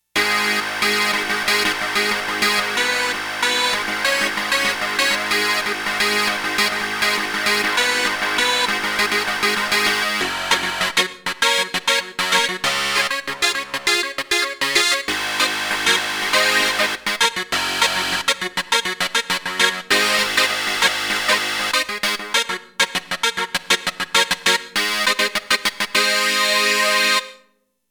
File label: BUZZY, Roland JX-1; Factory presets